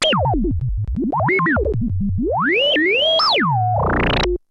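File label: Sample and Hold Filter, SFX; Syntec; Wall of Sounds VOL-2; TECHNO-BOX; 90s sample CD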